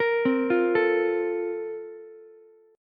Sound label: A sharp Major 7th Suspended 2nd, Ukulele; A#M7sus2; arpeggiated; JGuitar